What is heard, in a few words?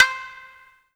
Yamaha TG100 Shamisen C5